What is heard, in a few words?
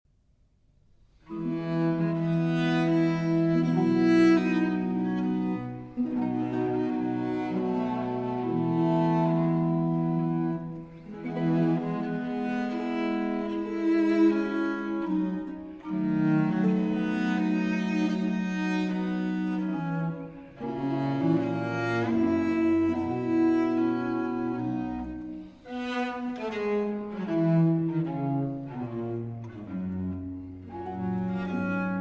Sonata For Cello and Continuo in F Major; Vivaldi; R.41